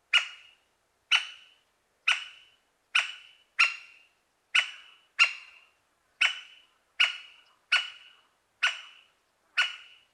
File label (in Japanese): ooakagera, bird